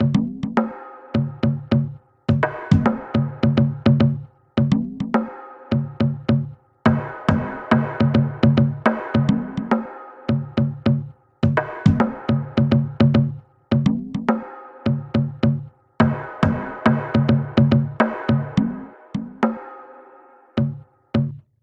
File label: Smog Drums; The Undergarden; Xbox 360